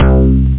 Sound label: synth bas